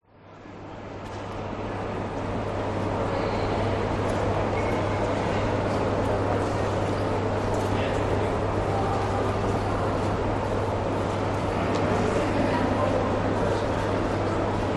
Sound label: Subway Ambience; west wolf